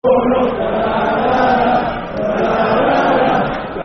Let's All Wave At Warnock Football Chant Soccer Song; Watford Wfc